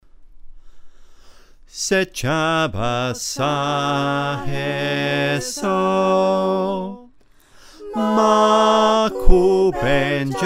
bass, choir

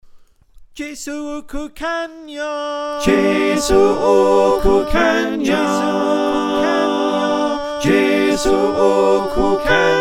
SATB with call, choir

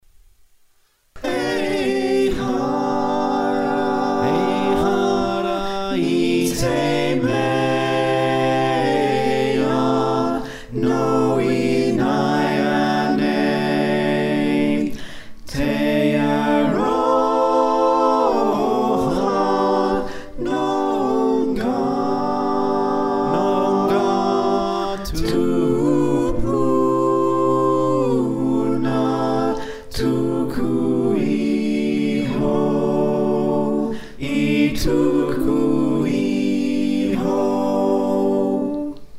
Balanced voices

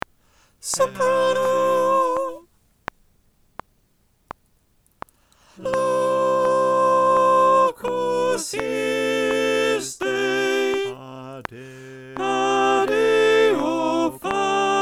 Locus Iste Phrase 1 - soprano

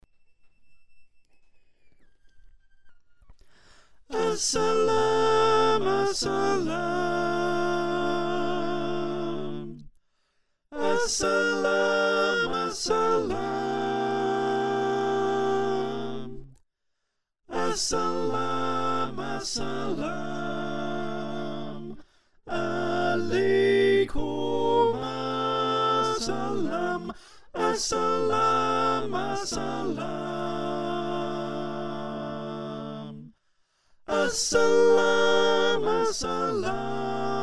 Soprano

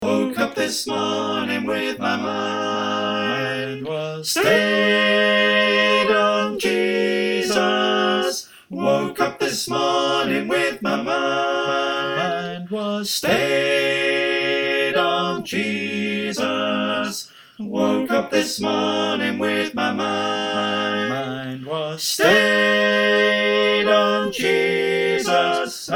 SATB

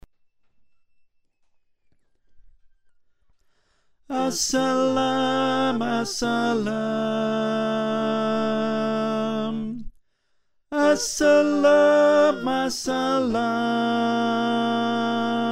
Tenor

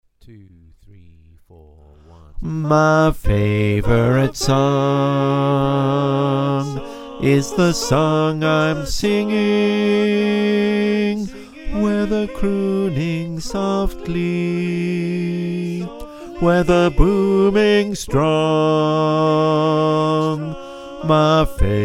Bass, choir

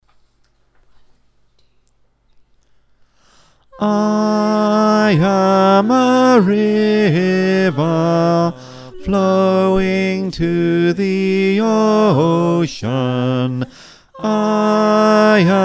Tenor

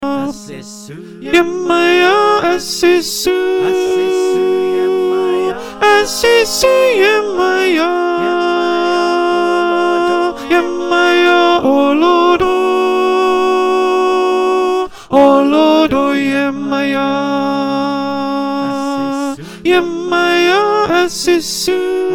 Low Soprano line

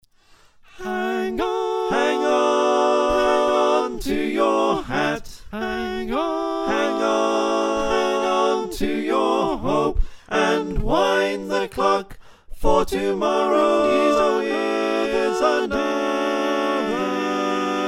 SATB

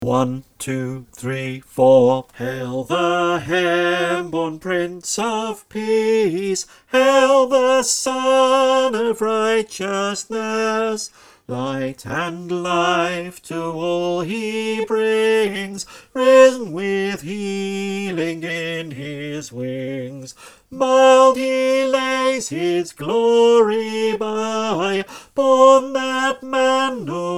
unison, choir

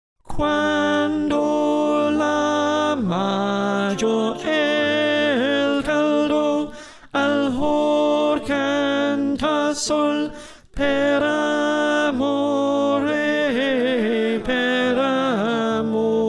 Slow Alto

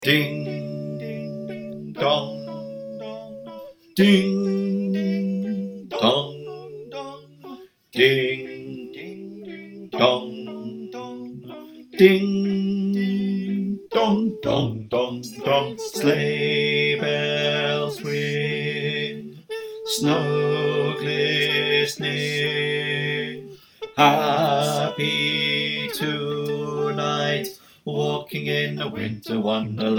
Bas

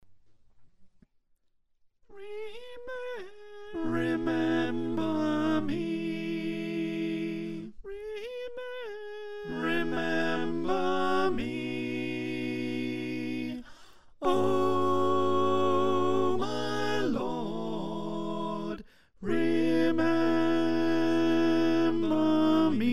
Alto, choir

